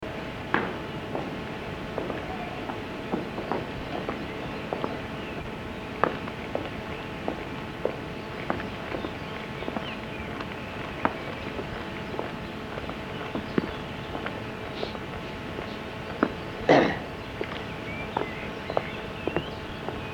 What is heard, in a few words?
shrine dictorphone mexico, street recording